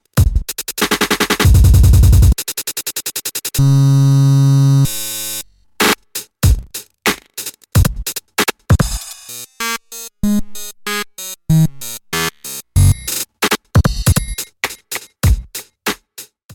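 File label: shift Grain; KP3+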